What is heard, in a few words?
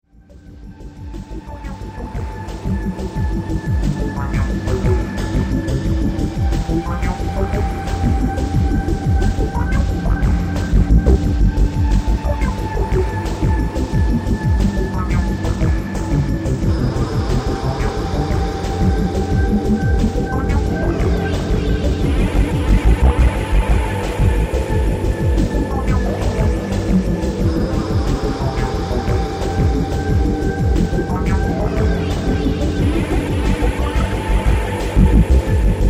synth